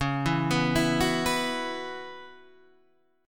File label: F sharp 7th Suspended 4th, guitar